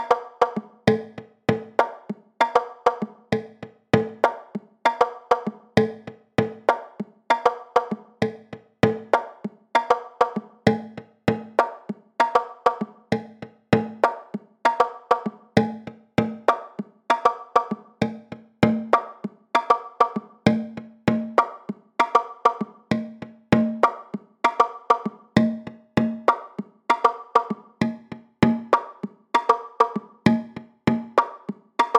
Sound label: congas various shapes; drum modelling